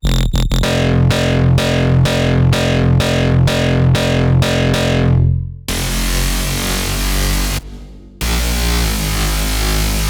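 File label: Bass Loop F minor 95BPM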